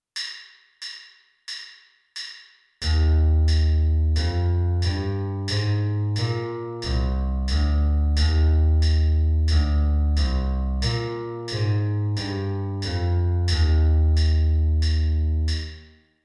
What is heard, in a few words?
e flat major scale electric bass